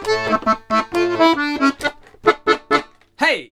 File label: Accordions; USB Soundscan vol.40; AKAI